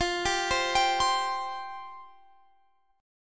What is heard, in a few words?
F Suspended 2nd F down Guitar Standard; arpeggiated; capo 2